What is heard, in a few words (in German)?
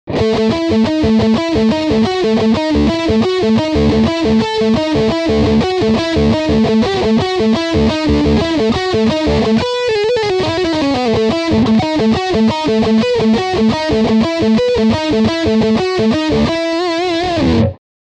Lick Steg Pickup